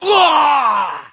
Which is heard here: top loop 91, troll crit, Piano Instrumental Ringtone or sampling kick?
troll crit